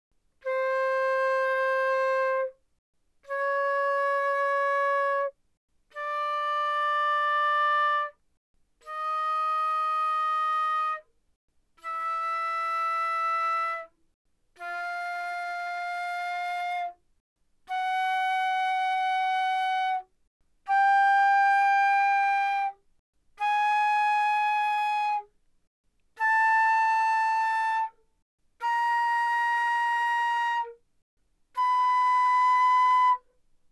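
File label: Flute non vibrato fortissimo C5 B5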